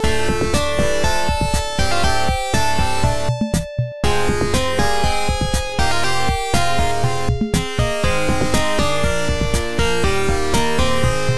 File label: Drum Song; Ken's Labyrinth